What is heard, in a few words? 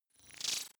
sci-fi expand flutter; sweeps-swells